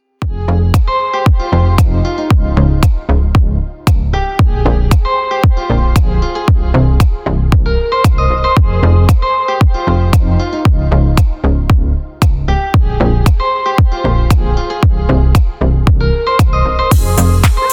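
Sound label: beautiful Russian melody for alarm clock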